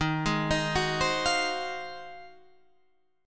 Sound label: Ab 13th Eb